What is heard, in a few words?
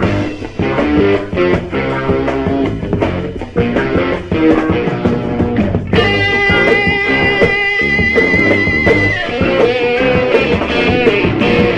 guitar